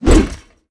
auto swing shield; smite; Ares

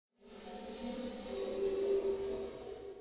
nightmare glide chimes; Smite; Thanatos

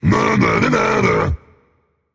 tongue rage; chaac

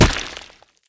pillar hit; smite; god ganesha skin11

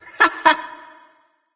hehheh; smite; hunbatz

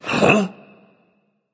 surprised, voice; Smite; ymir skin14

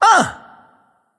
surprised; smite; voicepack; sobek

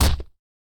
fire impact; smite; poseidon

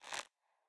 minion bow shoot; smite; npc; roman archer

